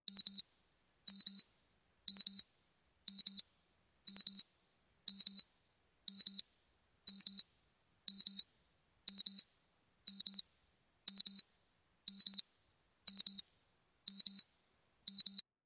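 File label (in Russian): электронный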